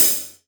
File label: Real Drum Kit, JUNGLE KIT; Best Service ProSamples vol.43; Real Drum Kits